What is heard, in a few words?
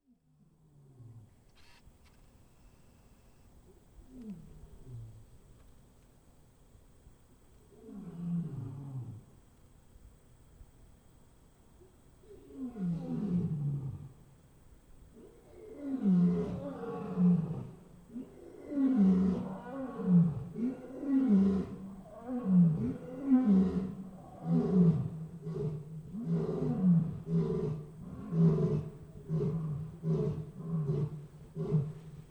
lion roar